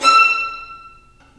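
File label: STR, Roland String Master Series, Violins Marcato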